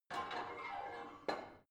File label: barbell heavy plate put on soft, fitnessstudio; sport freizeit